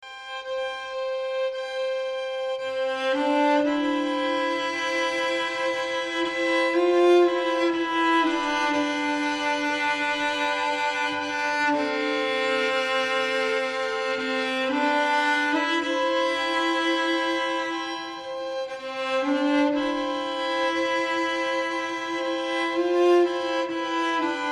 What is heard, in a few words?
Strings; Classical